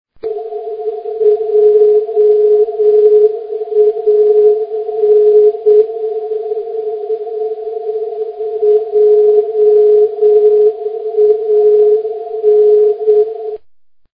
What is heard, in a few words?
cw